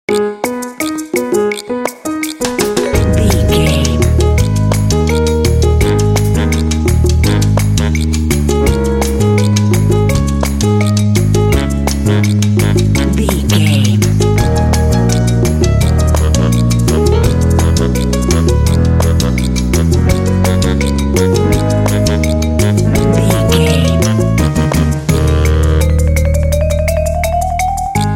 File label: cute playful latin theme